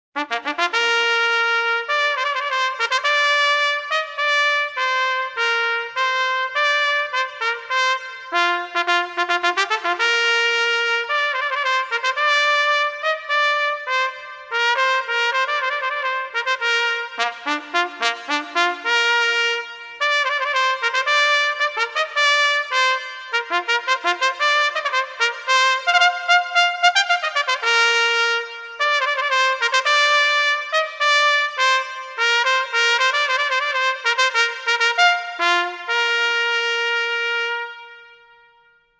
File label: Solo Trumpet